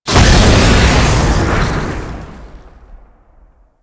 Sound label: Tank Spray Stop; Effervon; Weapons; Warframe; Lasrian